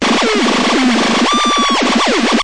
analog alarm goes crazy; Electro and Synthetic

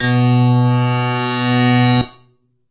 Moo Piano B2, moo backwards piano

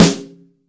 brass sd, Snares; single hits

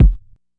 kick; Classic Hip Hop Mega Kit

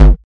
KICK, Volca; drums; hip hop; WallsVado Volca Sample Pack

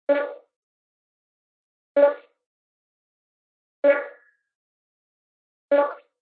oh loop; Ze Franks Pain Pack

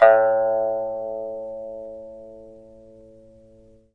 koto sounds

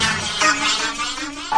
Electro FX; Ueb HE Electro FX

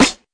classic hh Mxr Snr2, Classic Hip Hop; STYLE KITS (Hip Hop, Etc); Classic Hip Hop Mega Kit

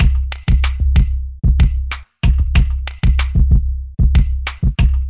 94 hiphop, drum loops and breaks

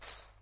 gungru, African and Eastern Percussion

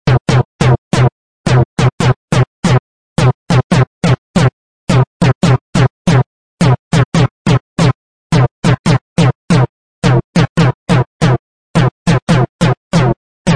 140 VibraTom; 140 Telephony

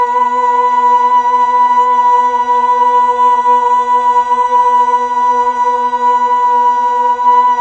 Mellotron Female Choir, B2

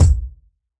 kick

